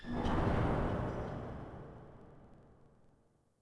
Space Crate Fall; Ambient; Sid Meier's Civilization 4 Complete; Beyond the Sword

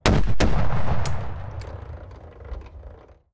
artillery unit fire; Hearts of Iron IV Death or Dishonor

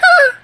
lil peanut hurt; Left 4 Dead 2

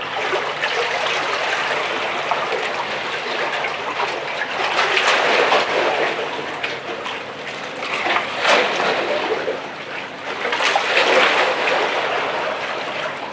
pier water loop; ambient, spacial loops; Left 4 Dead 2

